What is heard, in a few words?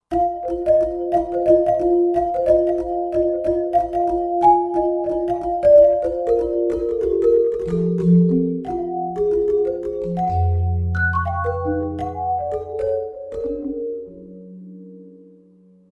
St. Jegogan; World; Roland SR-JV80-14 World Collection Asia